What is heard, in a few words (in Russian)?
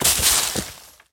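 громкое сухое шуршание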